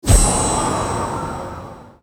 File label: frosttouch pickup